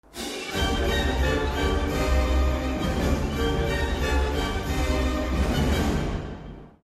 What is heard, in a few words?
Russian dance